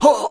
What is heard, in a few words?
man